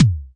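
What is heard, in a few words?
Pearl SC-40 Kicks, bass drum; drum machines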